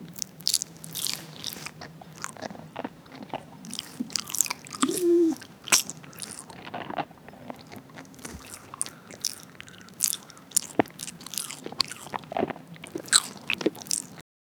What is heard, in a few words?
crunchy dry sea sand asmr